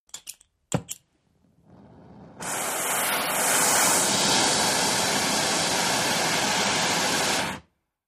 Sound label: Welding, oxygen - acetylene, ignite & burn; heavy flame